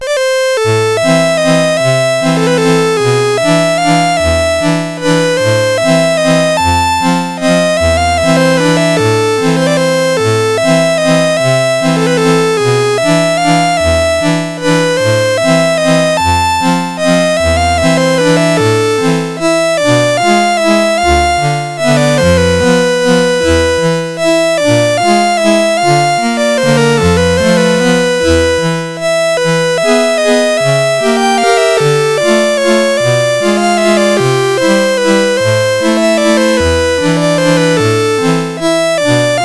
Shubert Waltz, CSG8580R5

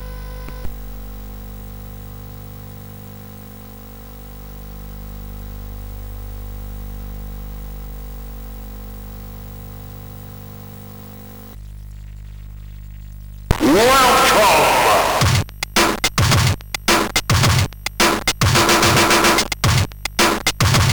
Drum Exercise

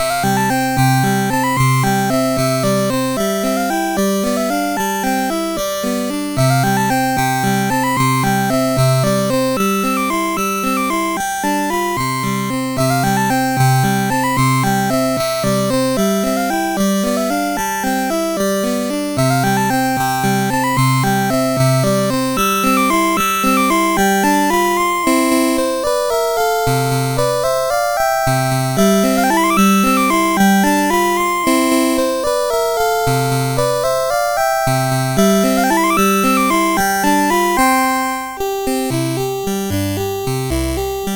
Waltz, CSG8580R5; Joseph A Grau

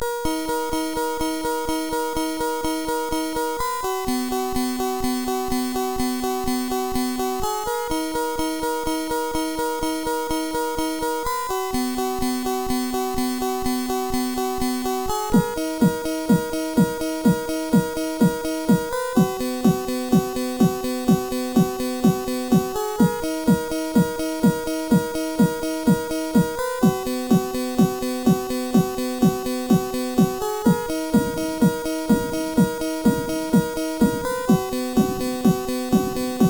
Thunderstorm sid CSG8580R5

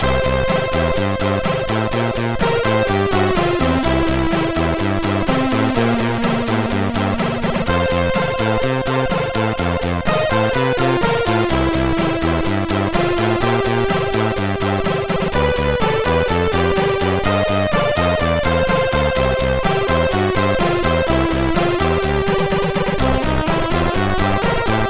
Nantcos Blues; MOS6581R3

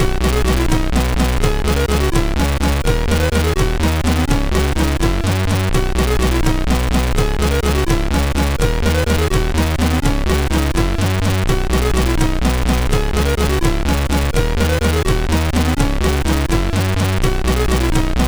Xmas Rock, sid MOS6581R3

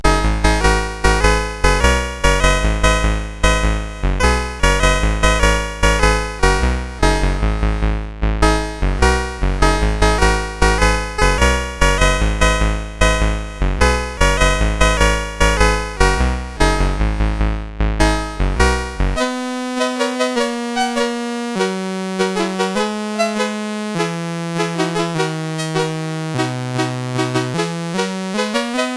Soundwave Tubular Bells

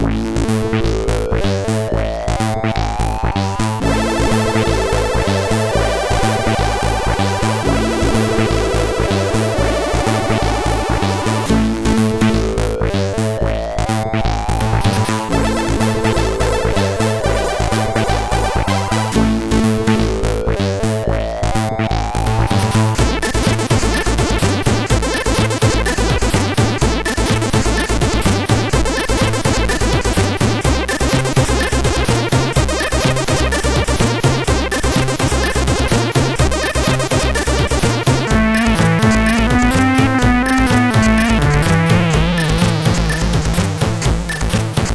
sid, CSG8580R5

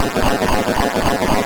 Boing, MOS6581R4

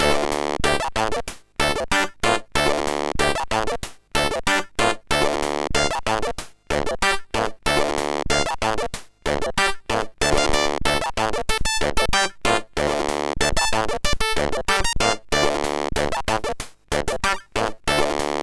Funky, MOS6581R3

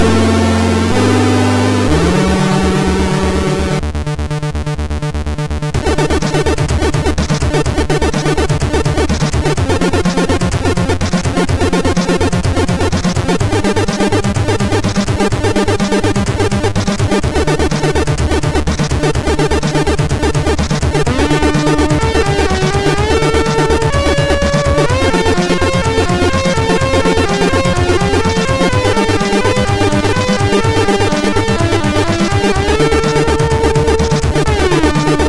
Plasma tune, sid MOS6581R4

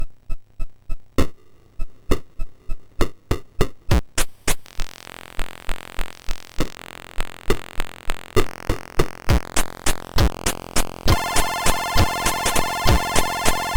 3 Bit Chip, MOS6581R4

